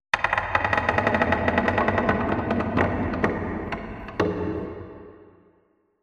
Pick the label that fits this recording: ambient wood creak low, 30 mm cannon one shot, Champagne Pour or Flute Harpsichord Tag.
ambient wood creak low